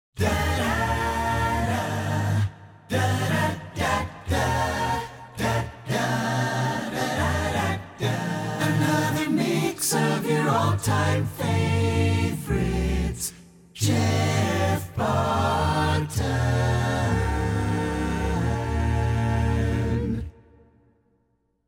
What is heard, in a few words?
Jinglebells